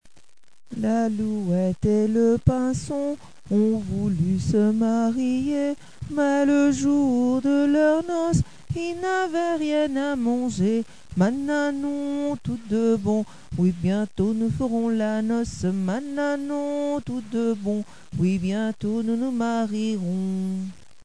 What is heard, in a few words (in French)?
oiseaux